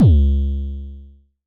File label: Dist Kicks C#2, Vermona Kick Lancet, Distortion Kicks; Drums; LookIMadeAThing Kicks